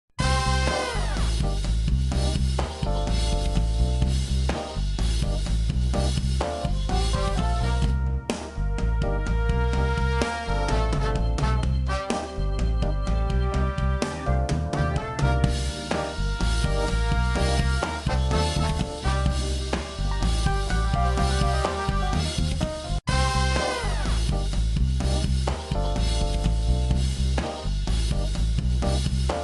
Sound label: Horns; Kid Pix 3 Deluxe; Windows